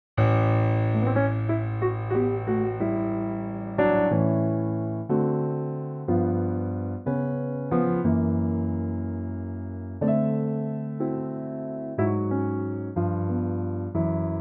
A piano

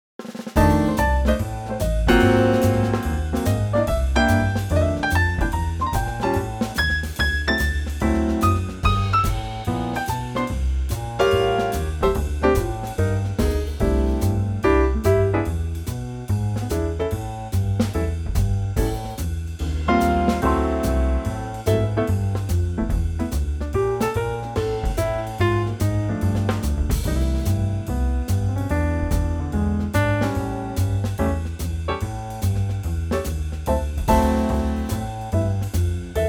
C Trio

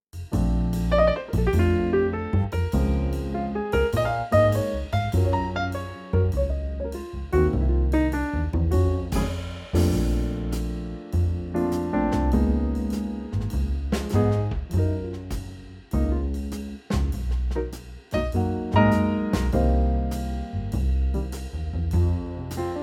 Bb Trio

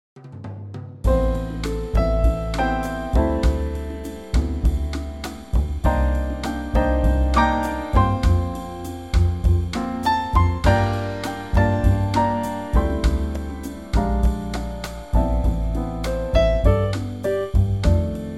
Am Bossa Trio 100bpm

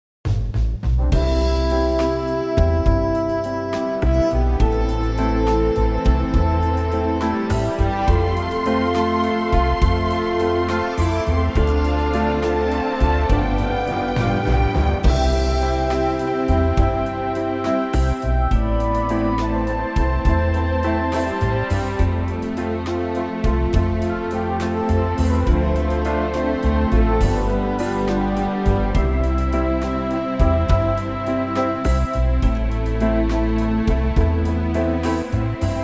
C orchestral

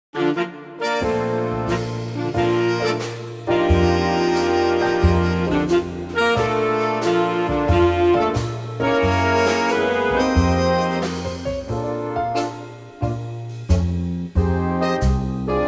Bb ballad Big Band

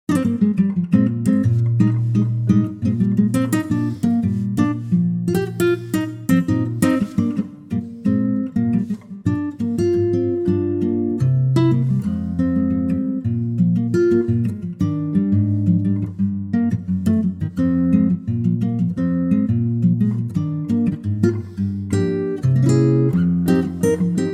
tangerine Eb acoustic guitar

